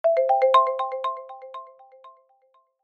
multimedia alert system

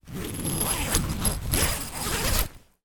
foley sleeping bag zipper

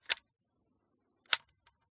camera crank pull and collapse; smena symbol; mkh8060; PMSFX

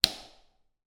light switch garage reverb; household

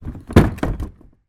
household bin wheelie down on concrete ground; zapsplat

